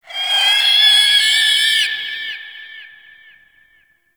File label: Avichaea Lure Call Success; Hunting Critters